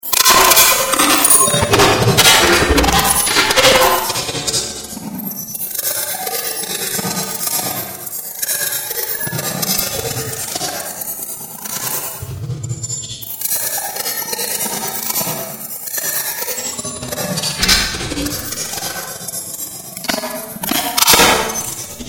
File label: drums